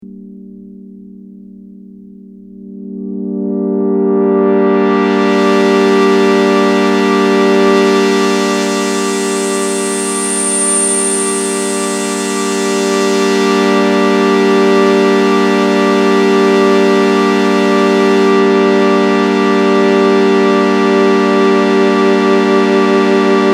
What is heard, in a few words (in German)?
Polymode mit Detune